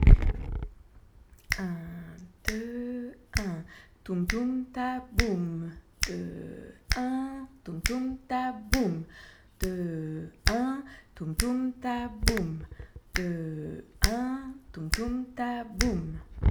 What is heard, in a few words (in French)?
rythme vocal en boucle